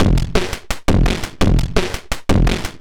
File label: Beat Octofuzz 170, distorted drum loops 170bpm; musicradar